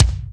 Kick, Akai MPC-500; Toolkits; Kick TKit

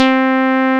ACID C4, Alpha Dance

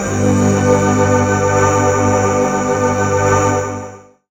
Deep, Pads; Zero-G Jungle Warfare 1, 2 & 3; 90 s Sample CDs

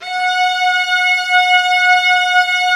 Vla F#4; Digital Sound Factory Virtuoso

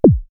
Diode, synthfx; X-Static Goldmine

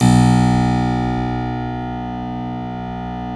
B 2 CLAV; Keyboards Acoustic; AKAI; USB Soundscan vol.17

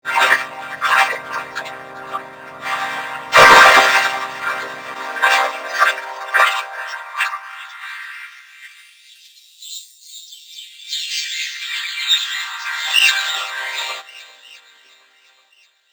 VOCODER, Vocoder Vox; E-MU Producer Series Vol. 8 – Platinum Phatt

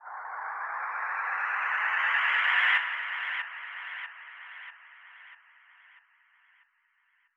FX Up, Club Techno, 140 BPM; Zero-G; Construction Kits

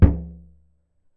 Frame Drum Head, contact mic; Raw

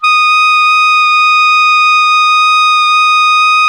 ALTO PP E 5, saxophones; Greytsounds - Philip Wolfe David Crozier's Saxophones

